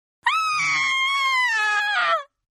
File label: Scream Female Horror; Screaming; Voice; Partners In Rhyme